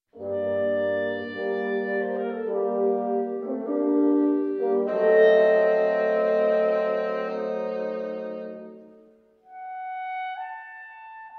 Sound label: Quintet; in Bb Major, op.100, no.6, Mvt 2